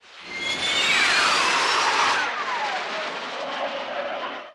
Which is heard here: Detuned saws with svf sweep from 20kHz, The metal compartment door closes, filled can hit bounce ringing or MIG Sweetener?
MIG Sweetener